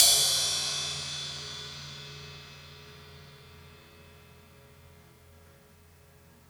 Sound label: Crash